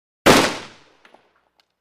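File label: single shot of M-1 rifle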